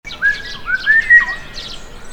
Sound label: click